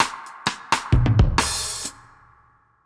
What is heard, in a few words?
Drums A Wet 130, dub drums; musicradar